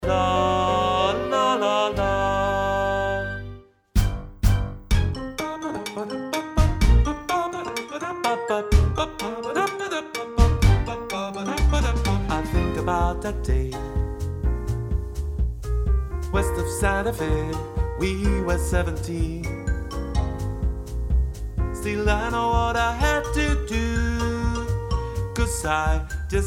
TENOR; ADIOSES SHOW CHOIR; THE CHRISTMAS SHOW; LA LA LAND

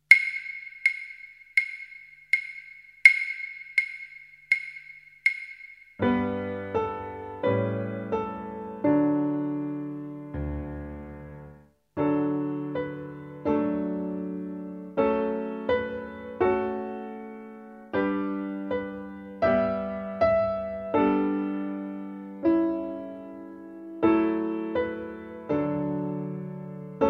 Backing, Violín; Apple Tree

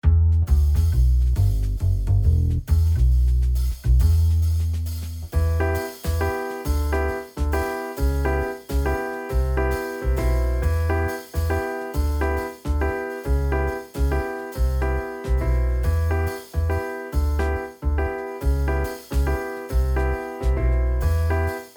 BACKING CAROL TRIO

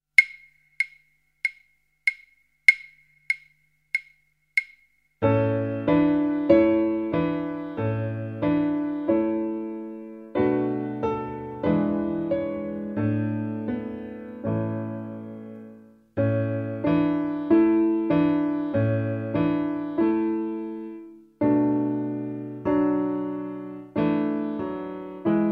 In a garden backing track, Violín

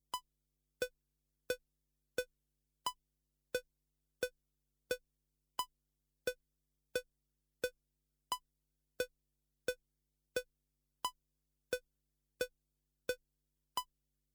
CLICK; ENSEMBLE VOCAL

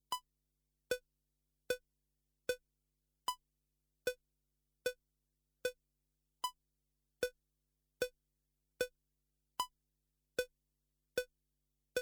CLICK 76; ENSEMBLE VOCAL

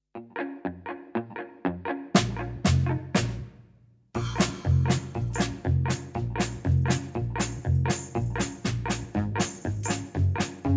BACKING TRACK BELLA CIAO

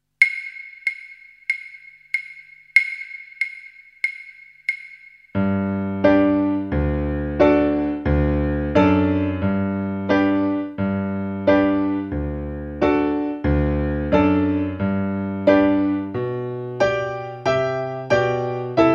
Backing, Violín; Circus Elephants

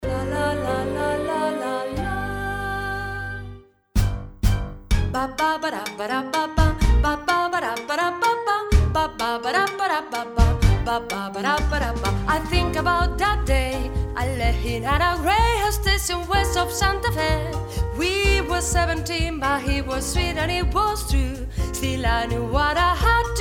SOPRANO, SHOW CHOIR; LA LA LAND; ADIOSES SHOW CHOIR; THE CHRISTMAS SHOW

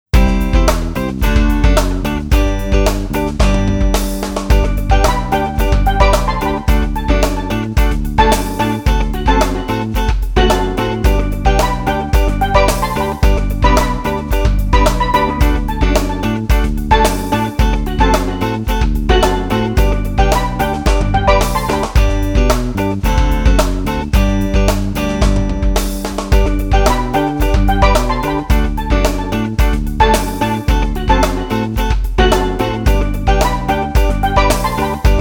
Steel Style, Música; Solfeo Adultos; Ritmomanía

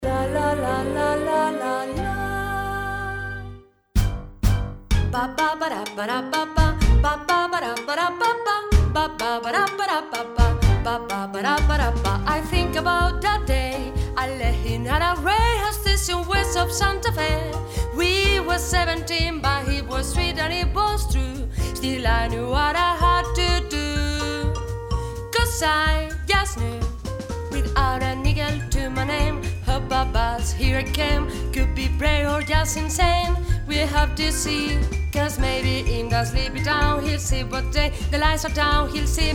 ALTO; ADIOSES SHOW CHOIR; THE CHRISTMAS SHOW; LA LA LAND

